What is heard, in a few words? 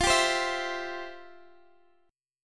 F Major 7th Suspended 2nd F, Guitar Half Step Down; FM7sus2